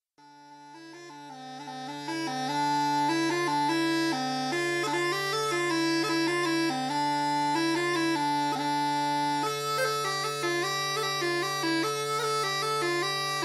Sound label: Smallpipe D